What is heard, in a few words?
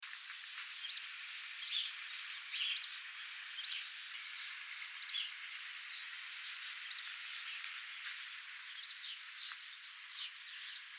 Humes Leaf Warbler